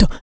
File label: HIT, Jazz FX FEM PERC